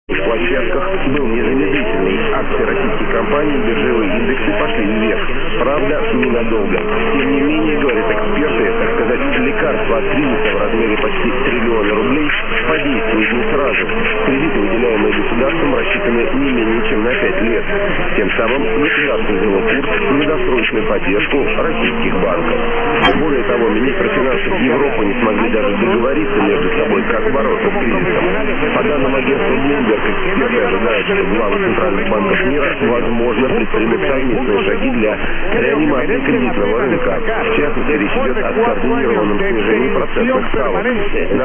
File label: dx 999 moldova spain saudi dogfight